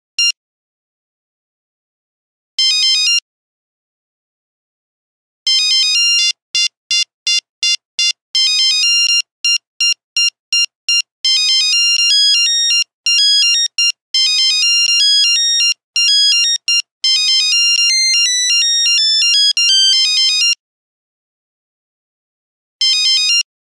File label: 3410, Nokia Buzzer; Windows Phone apps